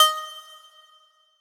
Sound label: Riddim Melodic Hit D# Chimes; One-Shots; Ghosthack; Riddim Essentials Volume 2